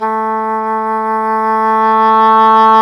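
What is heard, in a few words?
WND English Horn, Swell; Roland L-CDX-03 Disk 1; Eng Horn Swl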